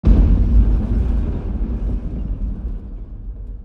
impact deep; The Swapper

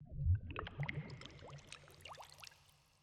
bubbles; CrossCode; radicalfish

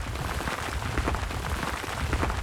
rock debris loop; CrossCode; battle

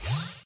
designer appear

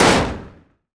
hit mechanical hard; CrossCode; battle

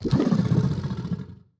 pillar fish; battle

